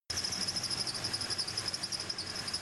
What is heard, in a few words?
Belt Squeal Worn Out or Misaligned Serpentine Belt